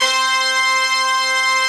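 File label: Brass Ensemble, Roland; Club-50 - Foundations Roland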